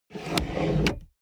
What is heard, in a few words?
plastic drawer large draw